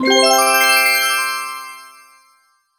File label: SFX Shine Sprite Obtained; Mario Kart 8